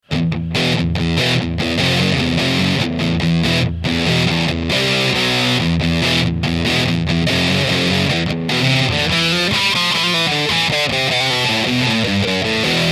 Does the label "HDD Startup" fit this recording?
No